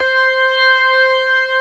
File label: STOPS, ORGAN; Keith Emerson Signature Series